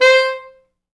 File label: C4, Pop Brass; Best Service ProSamples vol.19; AKAI